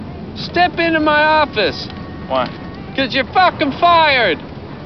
emergency button; among us

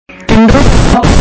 epic screem

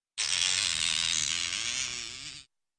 plastic stretches

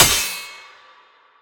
parry; limbus

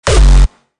hardstyle bass

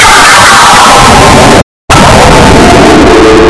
windows 10 hardware

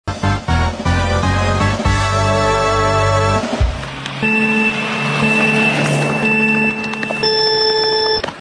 race countdown; mario

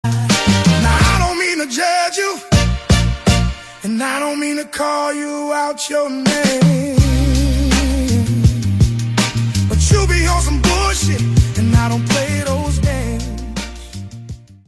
tired

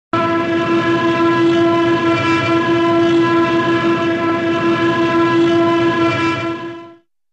plane flying

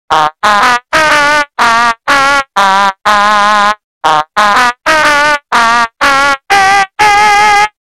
aaaaaaa spookyyyy

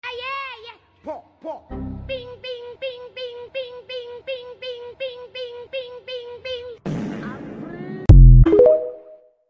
ayeye

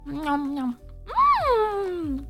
nomnom

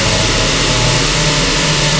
ambient, cave

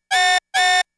panic button police

